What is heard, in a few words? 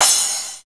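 TECH 140 crash, Big Beat Drumloops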